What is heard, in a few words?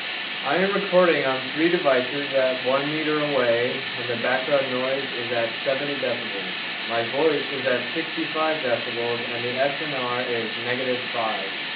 white noise, iphone 4; voice recorder